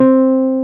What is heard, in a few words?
Wurly F2; SoundFont - The Developer's Choice - Volume 1